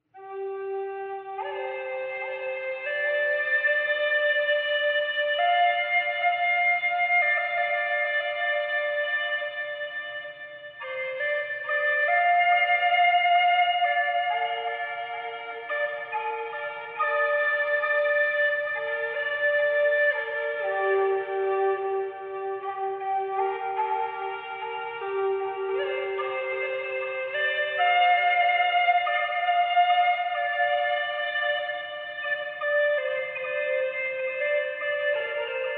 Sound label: native american flute